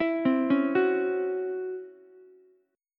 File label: Db Minor Major 7th Double Flat 5th Db down Ukulele; arpeggiated; DbmM7bb5; JGuitar